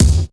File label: rich top end bass drum single hit C key, kick drum sample